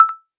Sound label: Double Tap, AirPods Sounds Case